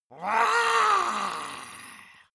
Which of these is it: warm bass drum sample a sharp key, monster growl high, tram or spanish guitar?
monster growl high